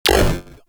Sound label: UI Corpus Theme Error; DE; Warframe